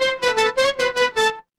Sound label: feedback level up